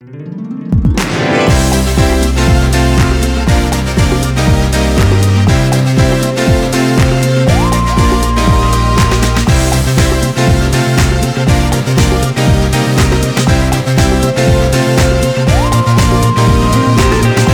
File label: Jingle; Gran Turismo 4